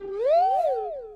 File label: notification oooo; the clangers phone sounds